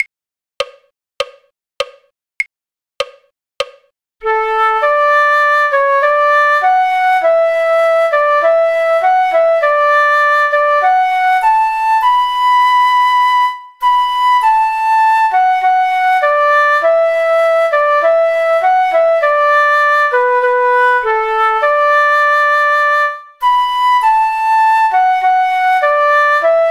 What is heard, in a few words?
flute; auld lang syne; folk